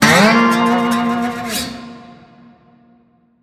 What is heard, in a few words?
large Win Riff; netent